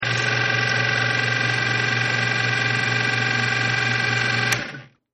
Nasal Irrigator No water, Nasal Irrigator Running With No Water In The Water Tank; Bathroom Sound Effects; Household